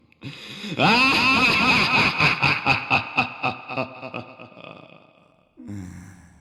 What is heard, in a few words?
Muhahahaha!